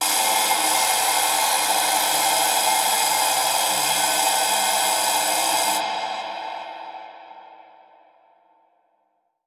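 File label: Echo Processed, alarm siren samples 125bpm; musicradar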